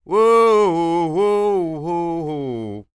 SING, Blues Soul

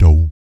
PERC, Vocal, Jazz FX, BASS PERC; Spectrasonics Vocal Planet

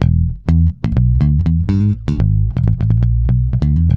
BOOG E, Bass, BOOGALOO; Spectrasonics; Bass Legends

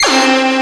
SYNTHS; WORS STATION; BODY BEAT

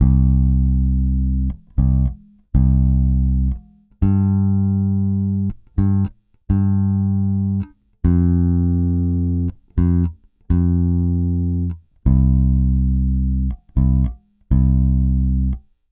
Pop, Bass

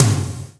RAVE TOM

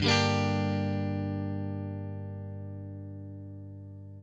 CHORD LN M, guitar; Quantum Leap - Guitar & Bass

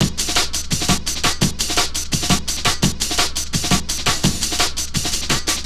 Dope Break, Drum & Bass; Fluid Dynamics

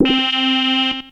Synth hits and riffs

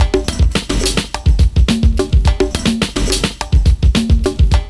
CONGA ME, loop; Univers Sons

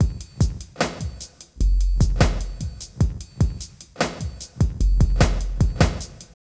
Beat

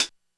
HihatCl, Korg DSS-1

